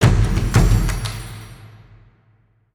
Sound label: Siege Field Drums